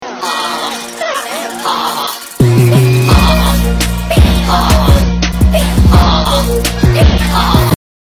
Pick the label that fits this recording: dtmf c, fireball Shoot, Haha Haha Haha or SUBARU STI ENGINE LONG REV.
Haha Haha Haha